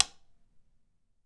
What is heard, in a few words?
switch on